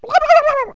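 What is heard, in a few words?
short gargle; CD Mania - The Perfect Multimedia Fun Pak!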